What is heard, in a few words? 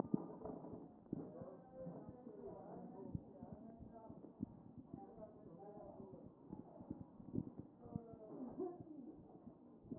CHEST pos4 D MODE